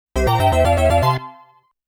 Round Start Music; Namco Classic Collection Vol.2; arcade soundtrack

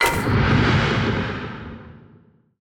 Victory Magic; Paladin

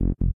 Pickup Fail

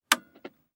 Tv Turn

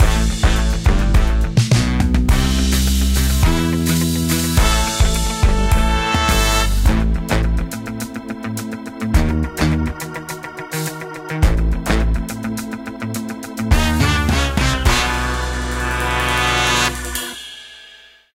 Stinger Cannon Fail; Club Penguin Island; android ios macos windows gamerip 2017

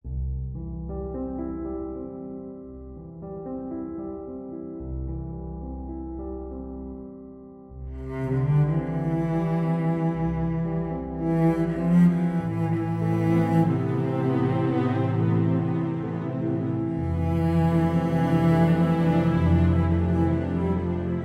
Intro Music

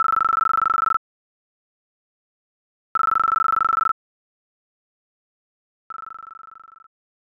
Phone Call; Super Family Tennis; SNES

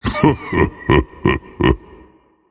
Vocal Laughs; Colossus

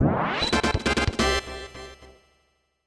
Fanfare; Kirby's Dream Course

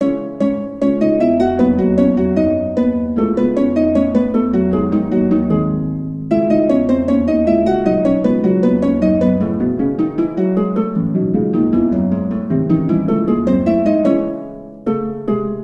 Harp; Rhyme of Duotime BT3; The Bard's Tale Remastered Trilogy

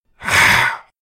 Cultist Notice Noise; SFX

